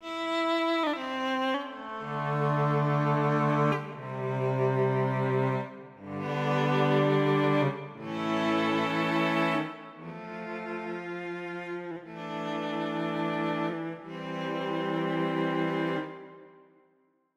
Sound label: fishman tripleplay cello; fishman tripleplay test